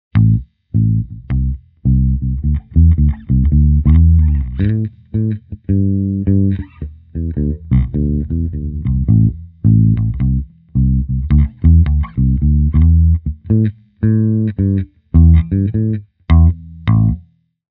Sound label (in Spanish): bajo